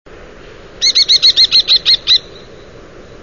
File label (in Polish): pustułka, głosy